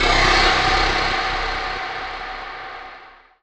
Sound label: TECHNO FX 140